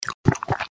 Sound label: gulp